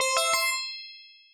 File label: jump jingle win; xbox360